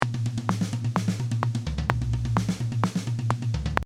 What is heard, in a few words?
Fill